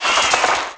wing

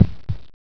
heart

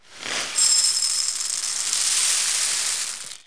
Pour Cereal into Bowl; KABOOM! - Wild and Wacky Sound Software; Household; Foods

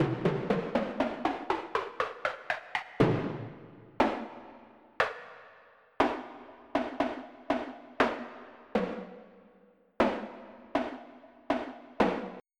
toms

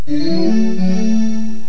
victory

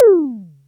syn tom; cubic

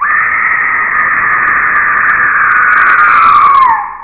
Woman Scream; TPUG Users Group CD; AMIGA

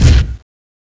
Bomb Release; A-10 Cuba; PC Gamer 40

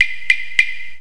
block; Select Soft Publishing Stock Clips Multimedia Clips; buttons

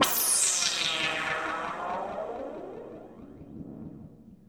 AMBNT 160; Ambient

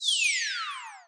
Close Program; Wormageddon

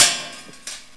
DEBRIS

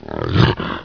gr2 run; TNPDEMO

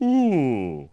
oooh; My Animated Word Book; meal

